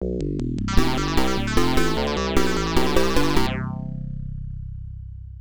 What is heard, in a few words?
Miss; City Connection; arcade